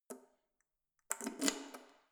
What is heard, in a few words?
letterbox metal insert key